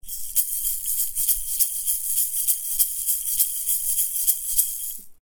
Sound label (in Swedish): prickigt rasselägg; plast